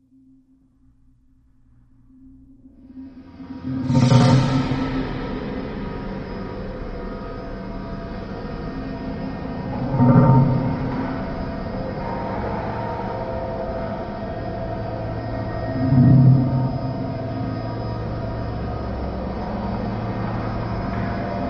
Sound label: Drones Only